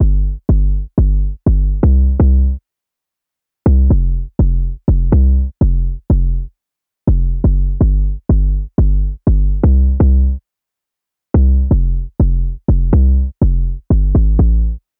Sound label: BASS